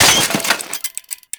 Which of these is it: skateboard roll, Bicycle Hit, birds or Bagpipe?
Bicycle Hit